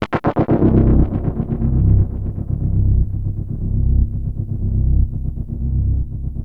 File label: Access Virus B; Synthworx